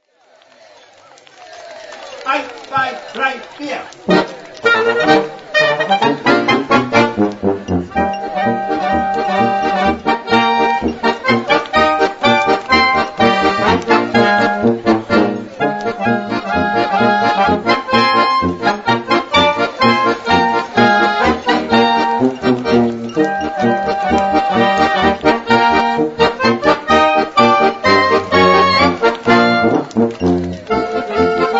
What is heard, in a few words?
Oompah Band German Marsch